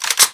famas forearm, weapons; garrysmod

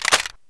sr25 clip tap; weapons; garrysmod

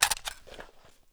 m4 mag out, weapons; m4a1; garrysmod

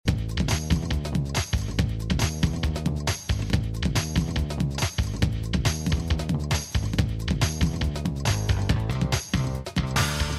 crash music; garrysmod

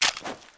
pp19 mag out; garrysmod

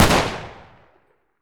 g3 fire; g3a3; garrysmod

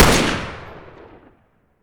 m14 fire, weapons; garrysmod

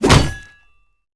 anchor hit; cstrike; war3source; tidehunter

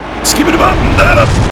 charge windup; democharge skibi

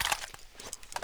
m249 box remove; mg; garrysmod

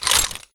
m249 belt; mg; garrysmod

